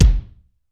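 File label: ROOM KICK, ROOM KITS; Crypton - DrumBase5000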